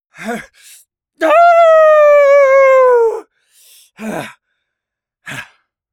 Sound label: Howl